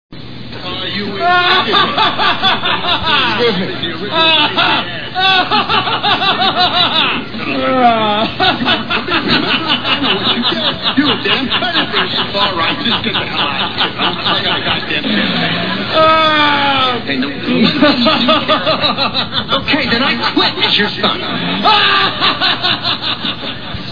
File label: laugh